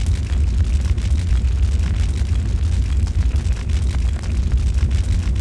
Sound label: Roaring Flames Loop; Nova Sound